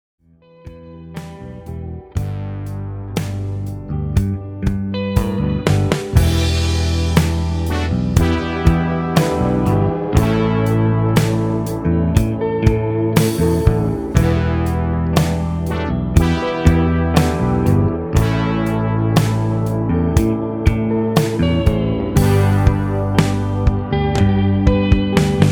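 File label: without Vocals Guitar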